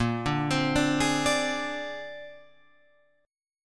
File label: Bb 9th Bb Guitar Open F, chord; arpeggiated; JGuitar